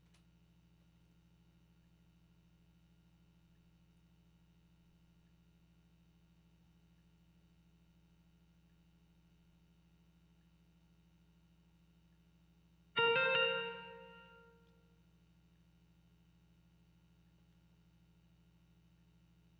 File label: gtr texture